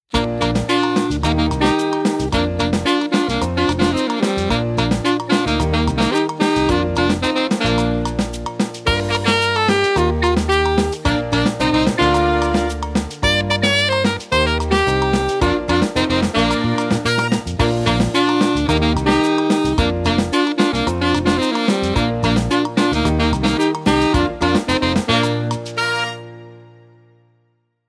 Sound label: news theme tune